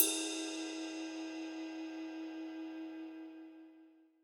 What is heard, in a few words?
ride single shot e key